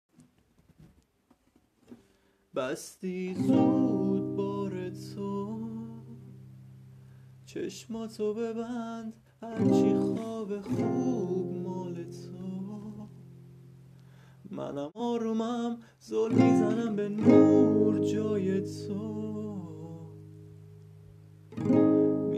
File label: Guitar